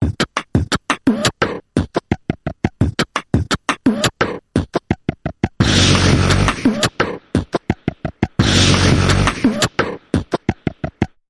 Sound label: Comic Mouth Percussion Variation